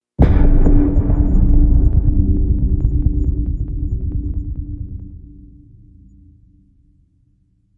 Warmth Tonal; Ninja Tracks

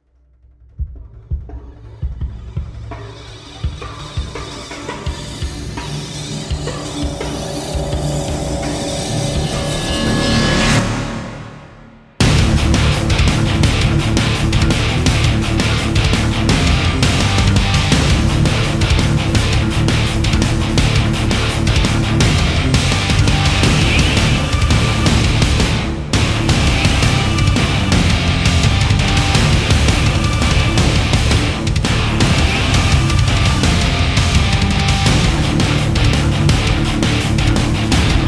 Beat Down (Band Only), Epic Speed Metal; Gothic Storm Music

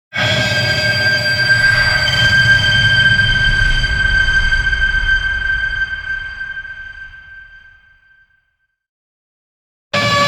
Screaming Wires; Epic Tools vol. 1

